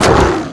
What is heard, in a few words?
Bone Tyranno ATTACK, game; Eft